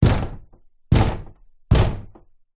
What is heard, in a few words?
household door glass sliding conservatory thump fist